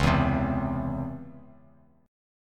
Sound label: Db Minor Major 7th Db; DbmM7 strummed; JGuitar